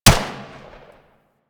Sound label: Pistol Shot, Weapons; Harlequin